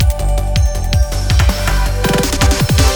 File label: Snow Transition; sky rogue